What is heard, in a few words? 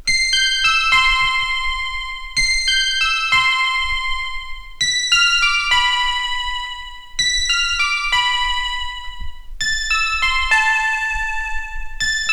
slow; valve; dream